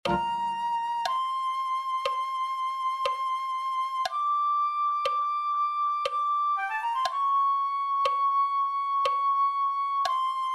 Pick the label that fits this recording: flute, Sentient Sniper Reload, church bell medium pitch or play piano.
flute